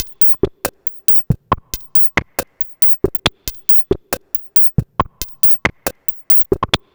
Electronic Perc

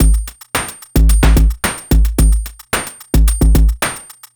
Breakbeat, Drums & Percussion

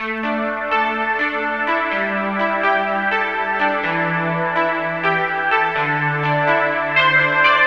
Pad

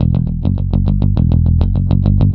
Electric Bass; Basses

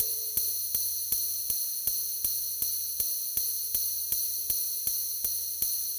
Breakbeat, Drums & Percussion

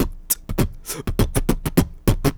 Hip-Hop Drumloop

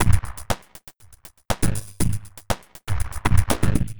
Electronica Drumloop

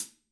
HiHat; Drums & Percussion; One Shots Drums

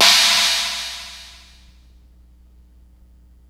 China, Cymbals; Drums & Percussion; One Shots Drums

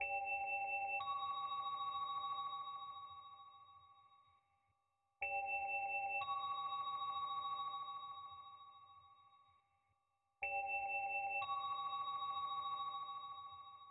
Synth

